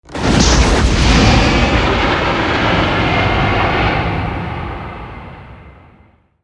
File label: jet by phasing noise